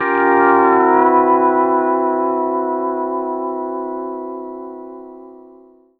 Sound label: PEDSTL CHORD, 9; D6